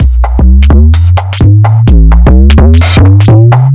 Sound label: Beat, 128bpm, uk garage